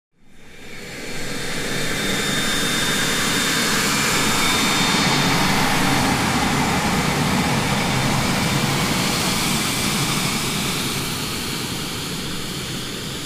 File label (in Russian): reaktivnij istrebitel dvizhenie mimo